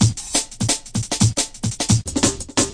tenderhearted junglist, drum loops and breaks, 175 bpm; 161 to 180 bpm